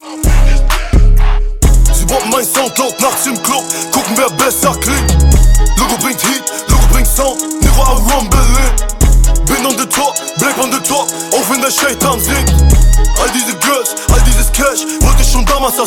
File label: GERMAN RINGTONE